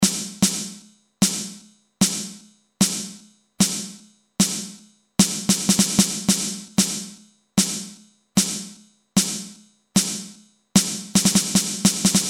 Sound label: Ringing, Snare; Industrial